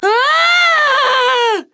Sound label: Falling; Paladins; Flak